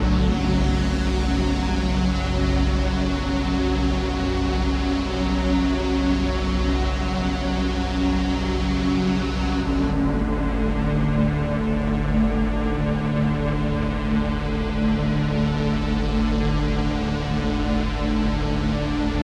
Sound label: brunchcanyon treebuild loop 100bpm CMajorMinor